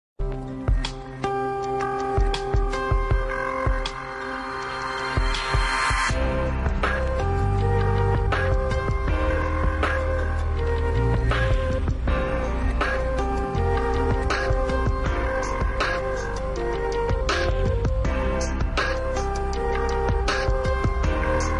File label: house theme